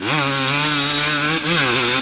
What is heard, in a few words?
Power, Engine